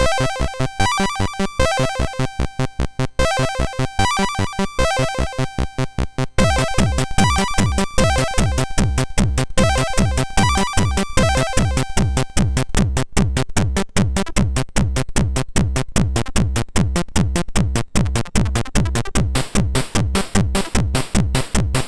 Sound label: Happy Cowboy, sid MOS6581R3